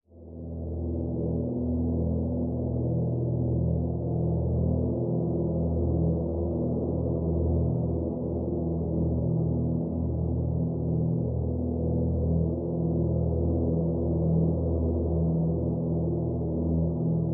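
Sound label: Drone Wind Farm Simple E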